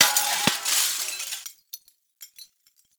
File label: Desktop Glass Destroy; Warframe; Entrati; Ambience